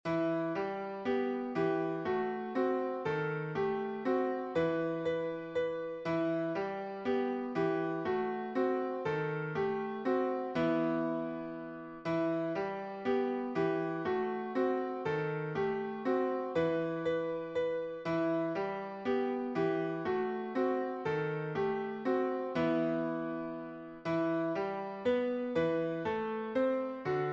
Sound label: Melody, piano